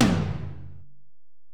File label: Tom, Alesis DM-PRO